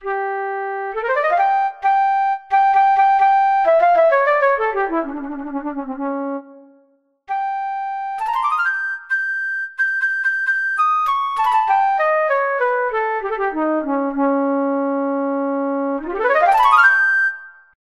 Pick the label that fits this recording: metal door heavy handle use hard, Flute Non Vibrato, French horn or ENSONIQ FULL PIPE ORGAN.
Flute Non Vibrato